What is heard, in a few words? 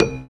PNO, Electrics; Club-50 - Foundations Roland; Rds & Wurly